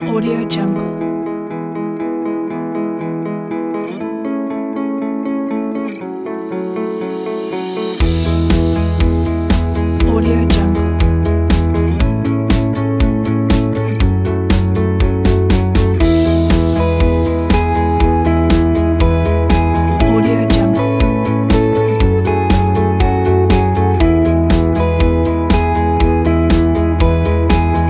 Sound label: gentle morning